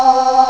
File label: chor; Amiga Soundtracker Sample Packs